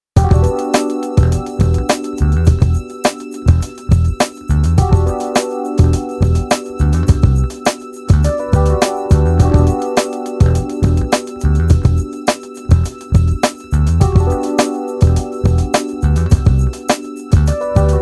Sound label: Xmas Cooljazz